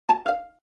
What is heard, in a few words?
notes sequence